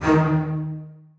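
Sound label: basses staccato d#2; Sonatina Symphonic Orchestra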